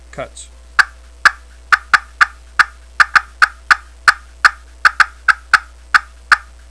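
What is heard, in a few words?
glass cutts, turkey call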